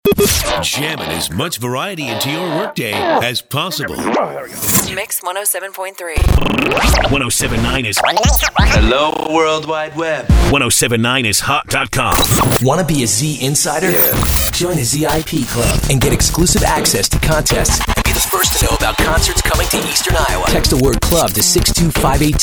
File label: pop